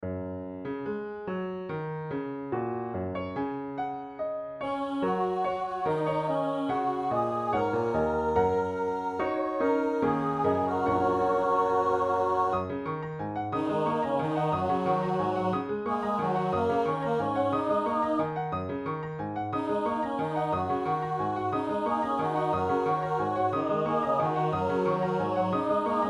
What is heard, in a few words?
Christmas Glory Choir